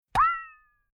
Hand Saw Hit And Metal Wobble Pitch Bend Upwards Impact Cartoon; Tom Hutchings